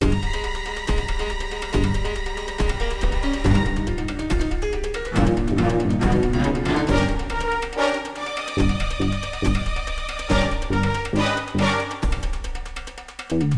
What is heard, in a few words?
Combat LOOP, soundtracks; MX Penny